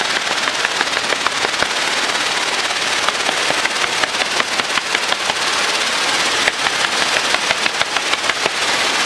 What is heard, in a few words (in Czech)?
netopyr stromovy, Nyctalus leisleri